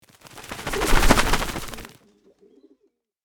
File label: Pigeon flapping wings birds